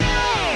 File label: zappy